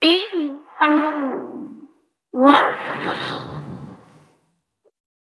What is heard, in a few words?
jingle